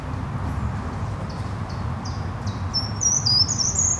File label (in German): gartenbaumlaeufer